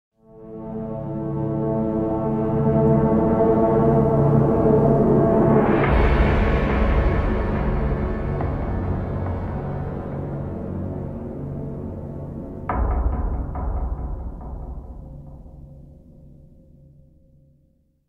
Suspense Theme; The Amazing Spider-Man; PS Vita, PS3, Windows, Xbox 360

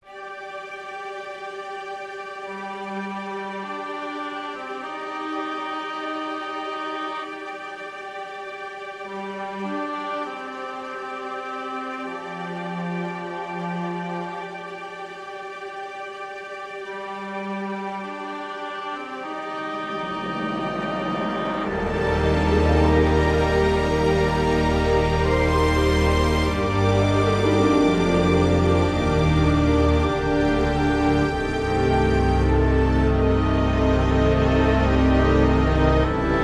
Ambient; Evil Islands: Lost in Astral

